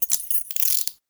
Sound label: Handcuffs